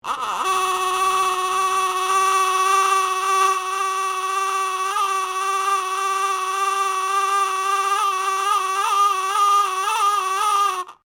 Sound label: SIREN HURRICANE HAND CRANK FIRE SIREN, Old hand cranked fire siren